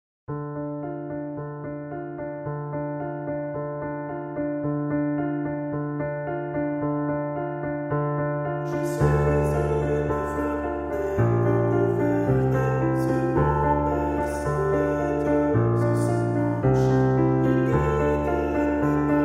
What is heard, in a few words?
Baryton